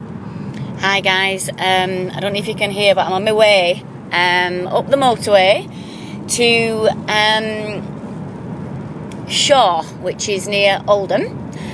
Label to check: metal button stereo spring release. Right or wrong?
Wrong